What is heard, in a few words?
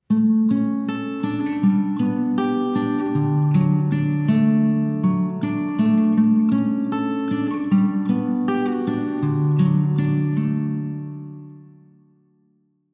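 Guitar Hall of the National Academy of Music, Kyiv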